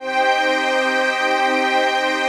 CHRD PAD; Best Service; XX Large Pads; Sample CDs

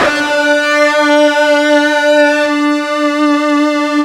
FUZZ D 3, ULTRA FUZZ, Guitar; East West; Steve Stevens Guitar Samples Collection

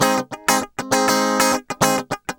100 BPM

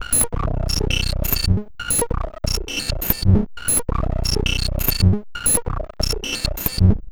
Lead

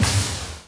noise fx; Masterbits; Switch FX

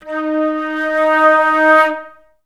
FL CRSC D#3, Advanced Orchestra; Best Service

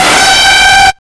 GEIGER, Hard & Loud Techno; USB Soundscan vol.01; AKAI

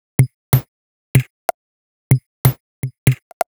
Top C 125bpm, House Drumloops; 90 s Sample CDs; Zero-G House Fabrik Drumloops; ZG Drumloops

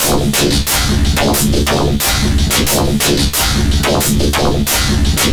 Rhythmic loop, 90BPM; musicradar; bitcrushed; Crushed Loops

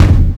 Adrenal Kick; Sonic Foundry (Sony Creative Software); Drums & Drones; Construction Kits

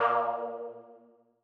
Lead A#2; KG MegaSaur

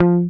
GTR TRIK, Jazzistic; Univers Sons

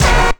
Ragga & Dub Reggae HIT; USB Soundscan vol.27; AKAI

